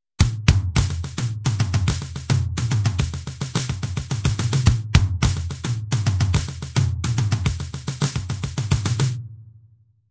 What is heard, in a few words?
drum pattern